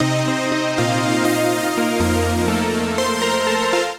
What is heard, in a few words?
with attack (Cm) 120bpm; Music Loops; Gracious Fields; Ableton; Singularities